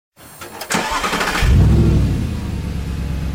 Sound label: Audi R8 Start Up